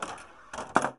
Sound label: Pinball Roll Metal Ball Drop